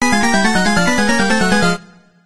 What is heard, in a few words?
Task Fail